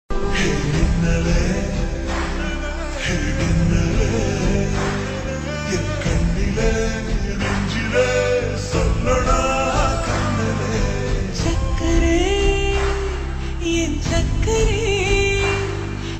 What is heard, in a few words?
Ringtone Slowed Reverb